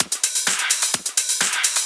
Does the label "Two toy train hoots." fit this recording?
No